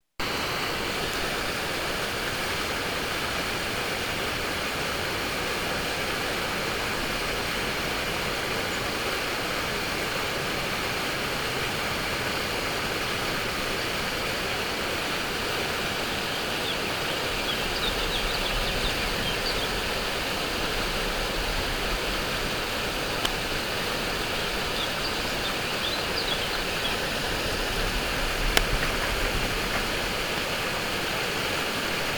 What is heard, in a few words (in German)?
Rauschen Muehlbach